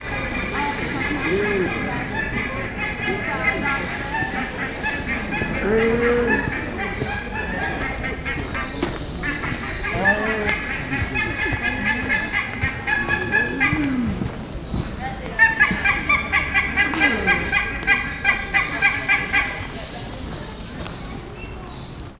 kanadai lúd; szegedi zoo 2011

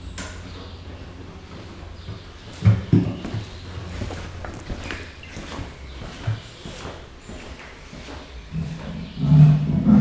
rejtett mikrofon, házból való kisétálás, ajtócsukás, leopárd; jaszberenyi zoo 2015

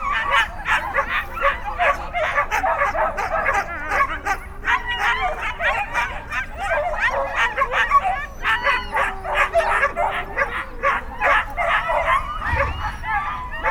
szánhúzó koncert autóindítás, kutya; állathangok; paraszt udvar

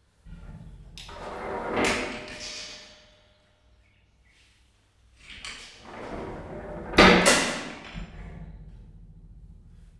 rácsozat, ketrecajtó; jaszbereny

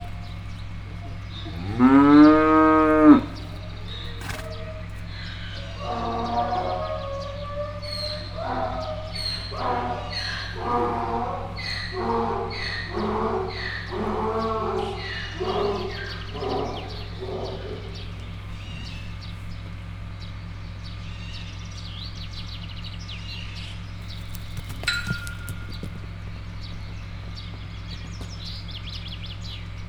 magyar tarka kulan, vadszamar; miskolci zoo 2018

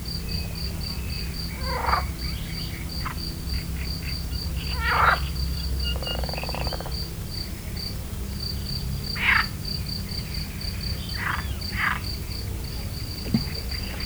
vartyogó béka, kecskebéka; termeszetben

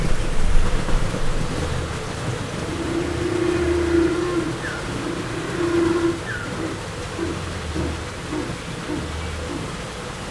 felnőtt állatok, az evés dicsérete, vízesés, pápaszemes pingvin; jaszberenyi zoo 2015

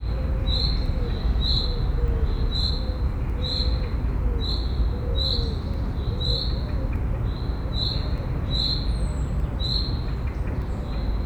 tücsök és távoli forgalomzaj; mezei tucsok; szegedi zoo 2011

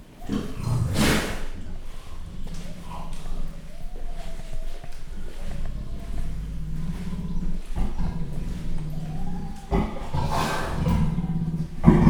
hörgő állat fehérkezű gibbon, leopárd; jaszberenyi zoo 2015

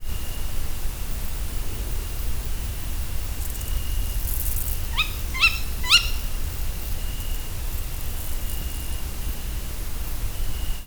pirregő tücsök is, macskabagoly; termeszetben

